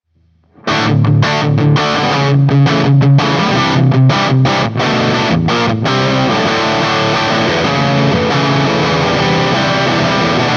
lp crunch

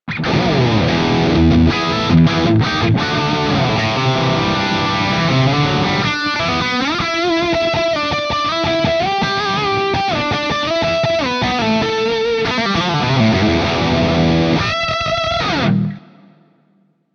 lp lead

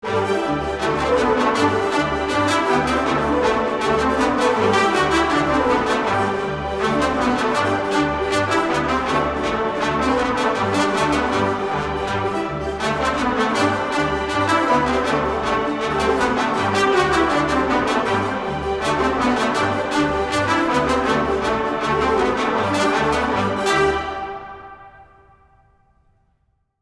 Symphony Brass

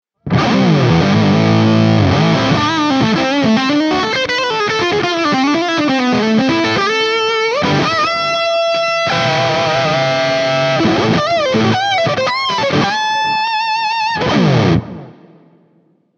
lp lead

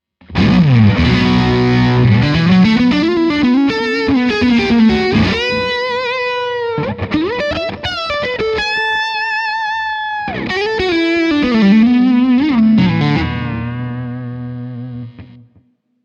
strat lead

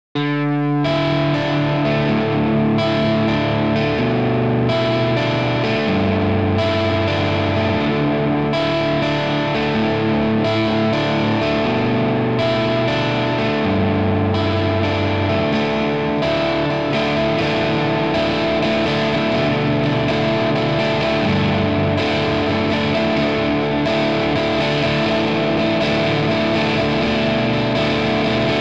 jaz crunch

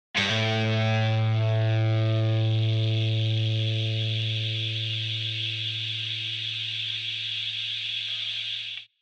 ARCHTOP noise

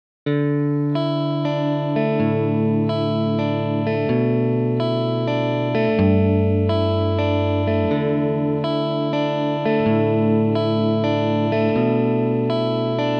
jaz clean

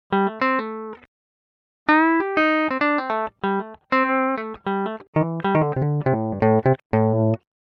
ARCHTOP SUS BRIDGE

